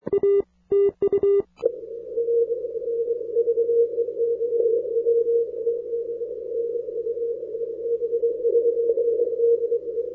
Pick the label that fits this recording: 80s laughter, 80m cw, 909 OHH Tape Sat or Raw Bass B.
80m cw